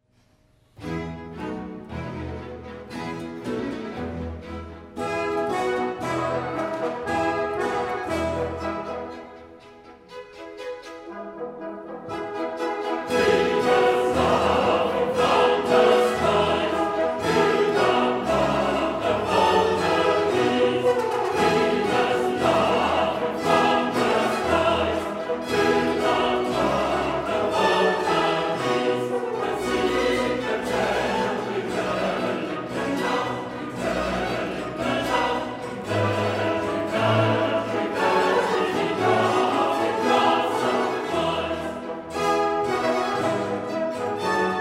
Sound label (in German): Chor; Venus; Cl. Monteverdi Marienvesper - Sonata sopra "Sancta Maria"